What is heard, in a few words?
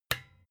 desk lamp off